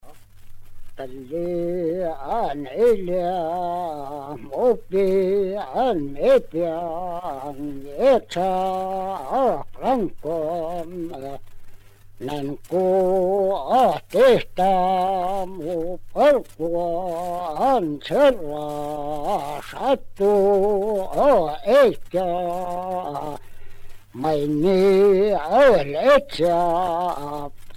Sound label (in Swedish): jojkar sig själv; folkminnen